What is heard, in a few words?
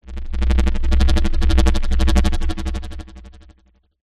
sound design pulsating stutter mid low; zapsplat